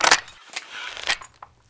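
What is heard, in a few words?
crossbow pull back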